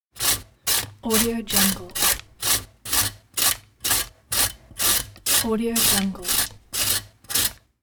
grating carrot

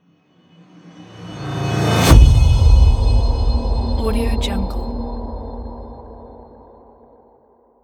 impact logo

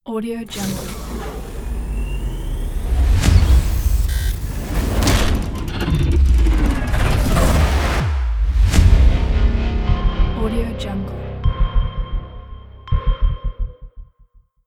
cinematic transforming gear logo

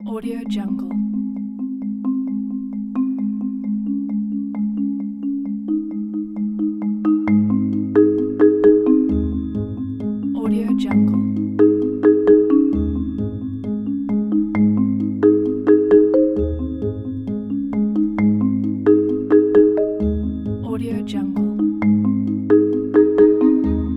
curious cute and small

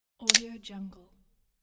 foley briefcase lock

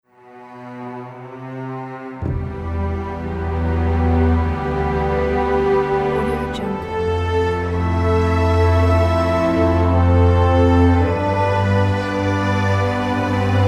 epic emotional trailer

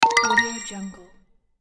success

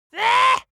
creature dwarf disgusted

